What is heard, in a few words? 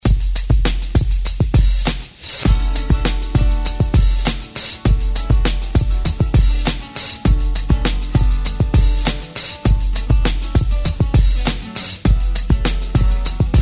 70s; Redrum